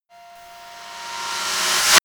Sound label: reverse hit electrocuted